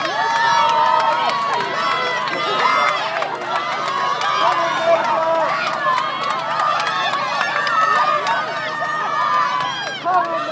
spectators cheering on the teams

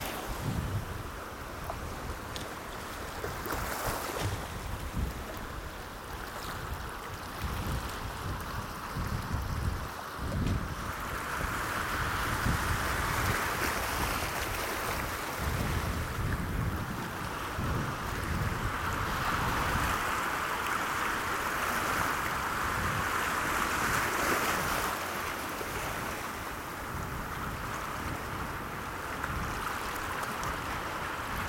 ebb tide binaural recording from beach; cocklawburn beach northumberland; best to use headphones

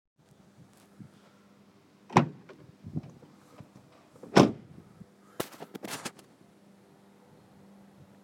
car door

